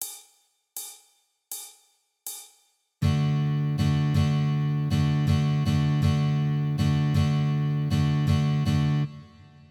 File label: D Major chord